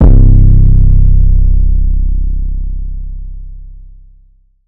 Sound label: 808 (C); Damage; Cymatics; GHOST (Beta Pack)